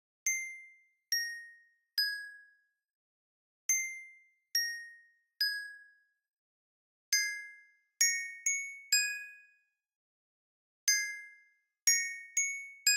baby music box; DeadFright

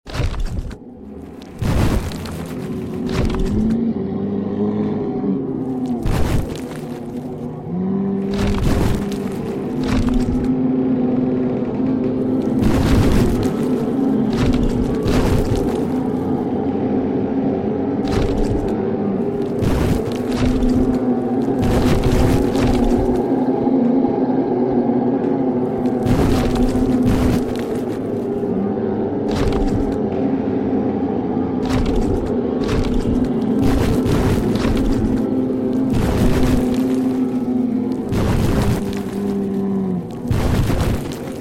Lonely Manor entrance Hall

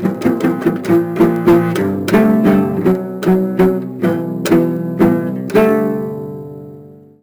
Cello Strum Badly; SFX Kit; Foley - Misc

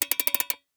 Alarm Clock Wind; Blastwave

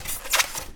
Squeak Styrofoam; SFX Kit

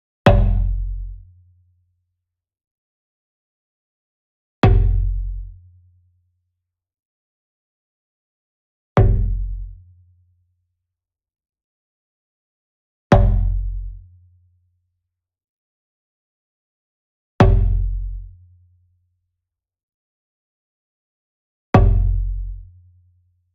Wood Percussion Big Bom Hit Near; boom library; Cinematic Strikes Construction Kit

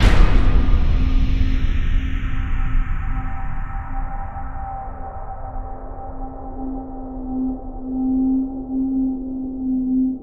STING Echoed Slam; boom library; Cinematic Metal Impacts

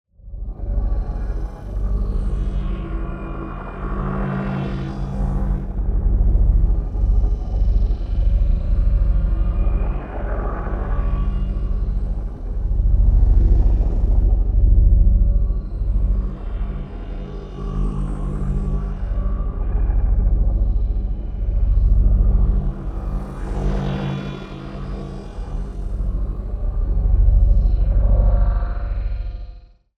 NEON AMBIENCE LOW Concentrated Plasma; boom library

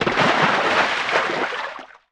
Missile Water After Splash; Destruction

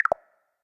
UI Toggle Off; They'll Come from the Moon

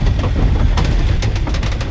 Scrape Bookcase Slide Loop; Doors

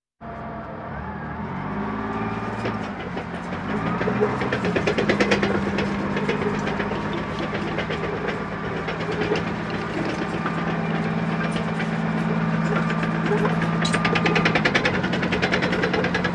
Military vehicles

